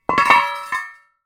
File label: industrial metal post driver put down on concrete ground; zapsplat